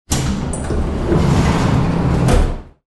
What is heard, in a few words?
open; Elevador